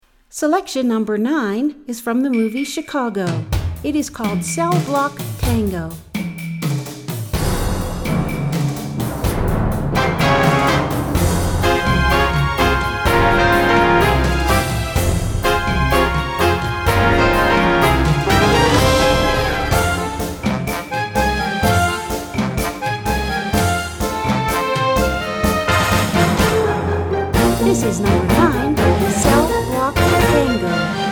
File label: Tango